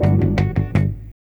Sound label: Da Da Da Da 080 F#, Lounge Lo Fi Break Fillz